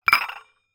household 2 metal dessert bowls hit clang together